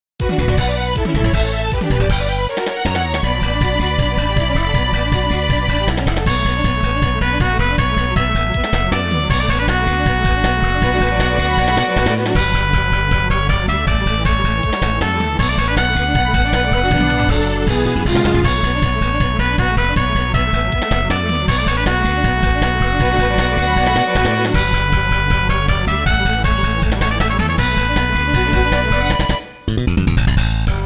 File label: battle; roma2